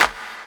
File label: CLAP HOU, Dance Mega Drums; Best Service